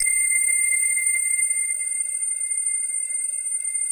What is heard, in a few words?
digi pad, Pads